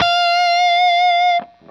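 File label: fuzz guitar vibrato; MAYFUZZ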